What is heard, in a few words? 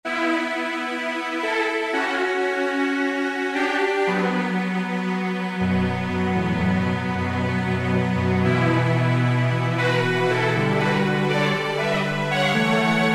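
4th layer strings; Roland SRX-05 Supreme Dance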